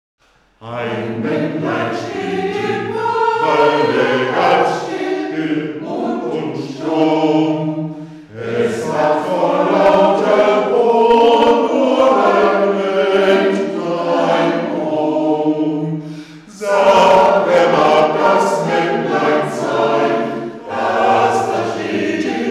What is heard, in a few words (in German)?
Maennlein im Walde